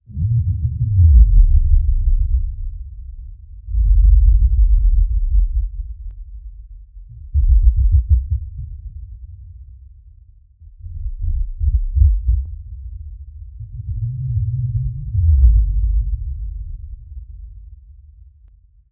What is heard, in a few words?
amb system reality breach sub wobble; Stellaris; nemesis